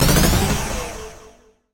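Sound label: Production Element Ascend; sound-effects-bible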